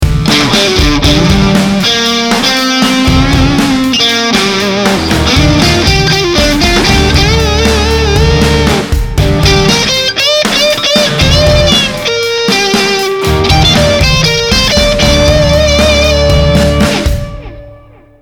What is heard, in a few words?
solo; ad30; IK Multimedia AmpliTube Orange